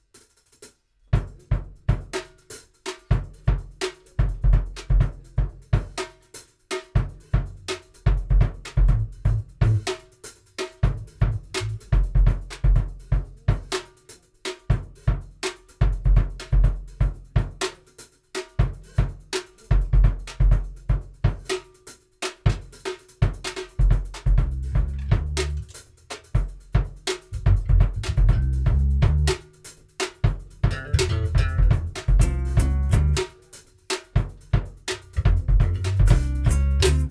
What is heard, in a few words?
Groove Snippet